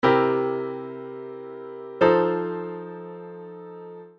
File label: shell voicings voice leading